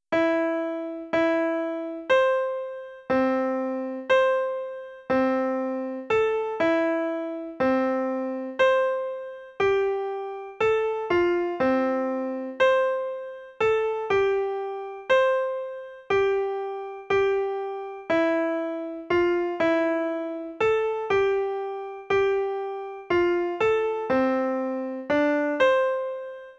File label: generative music